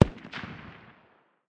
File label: dmr far distance, 7.62x51mm; tacz-tweak-pack; taczmechanics